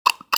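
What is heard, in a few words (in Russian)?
clack для стандартных звуков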